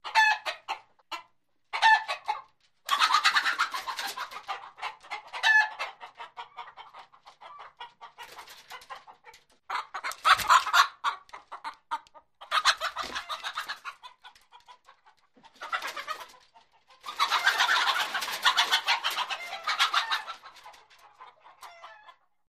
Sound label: Chickens Cluck Squaw